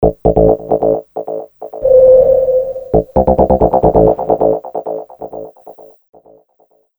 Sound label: Jingle Hypnotic